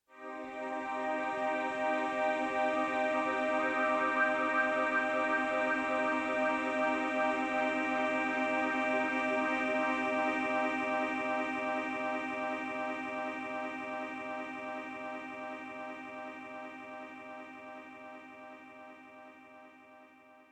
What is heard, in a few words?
drone